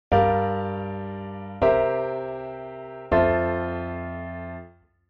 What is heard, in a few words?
2 5 1 inv F jazz piano; 2-5-1 (or ii – V – I) chord progression; jazz music